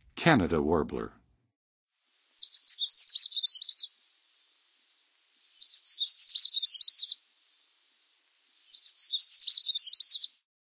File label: Canada Warbler, Songs of Birds of North America; Western USA; Western USA Songbirds